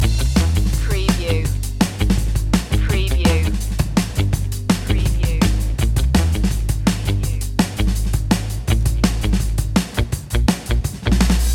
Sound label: spanish tango music loop